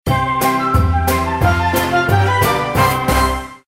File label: WIN MEDIUM; Roaring Wilds